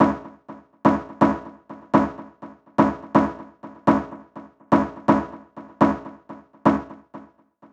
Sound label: Synth Loop 124 A Short Stab Synth Loop